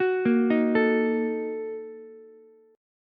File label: A sharp Major 7th Suspended 4th Sharp 5th, down, Ukulele; arpeggiated; JGuitar